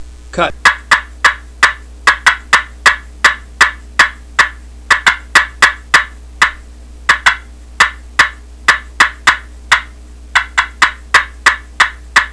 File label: slate cutts, turkey; canecreek